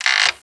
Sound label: CREAK